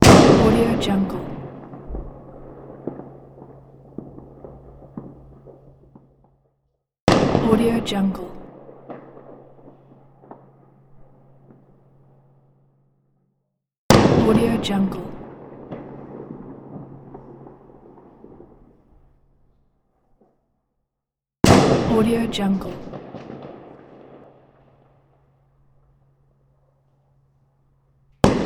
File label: firecracker explosion pack; debsound